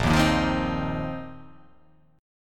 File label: A sharp Suspended 2nd Suspended 4th down